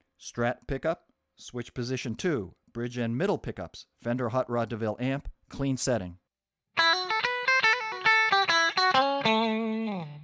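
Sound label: strat bridge mid hot clean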